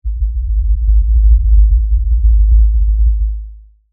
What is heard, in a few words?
Tremor Long LFE, Scifi, Tremor, Long, Lfe, Rumble, Science, Fiction; BlastWave